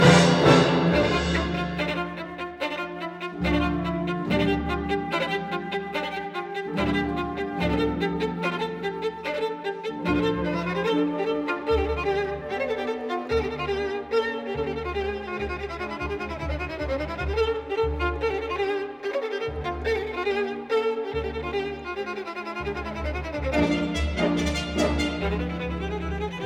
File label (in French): Concerto violon; Khatchatourian; Radulović et Schuldt vous font danser